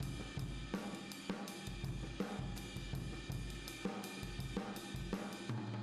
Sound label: Boogaloo Chorus Room M, 82 BPM